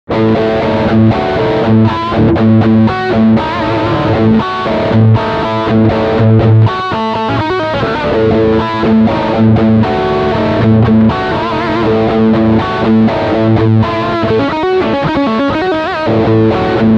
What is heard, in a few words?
Bridge Humbucker Distortion